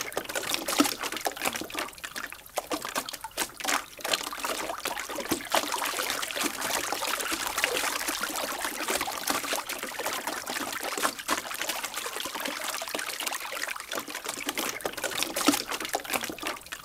water against something; firefight

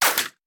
Bullet Impact; Sound Ideas; Guns

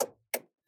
turning signal; vehicles; NS Studios - ultra sound collection

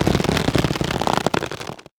Stretch Twist, Foley Creaks; SFX Kit

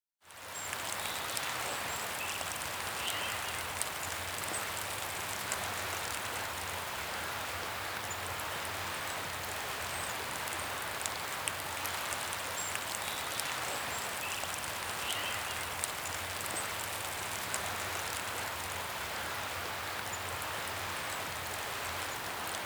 ambience forest; NCH Library

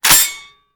Shotgun Foley; Sound Ideas; Guns

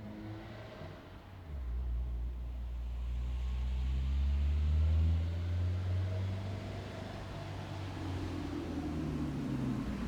car comes close stops, street traffic; best service Blue Box

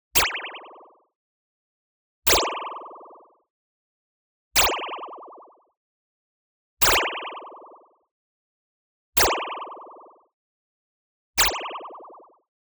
ZAP Metal Synth Impact High Fast; boom library; Anime Essentials; DSGNMisc

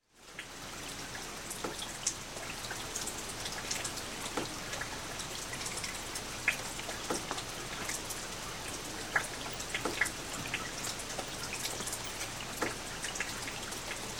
Rain, Light On Lake; Sound Ideas 2000 Series

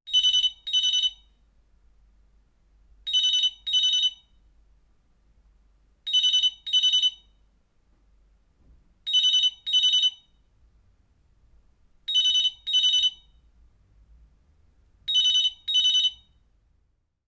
Telephones

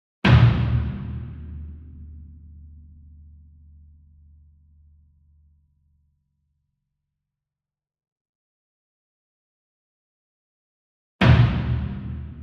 Bass Drum Concert 40 Inch Hit Hard Beater Far; SINGLE DRUM; boom library; Cinematic Strikes Construction Kit

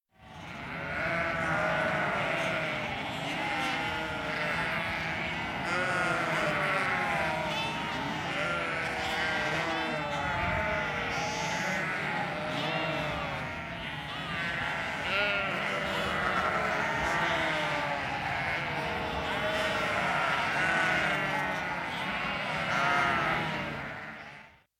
Pigg roaring; KMP Film Video Sound Effects

